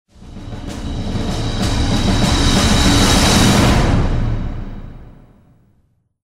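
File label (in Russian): Нарастает как марш; Звук саспенса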